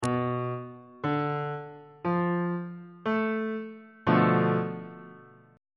e double flat minor 6th 3rd inversion on bass clef